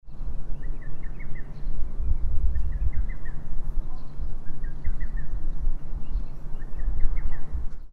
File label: little cuckoo